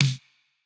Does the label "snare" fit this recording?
Yes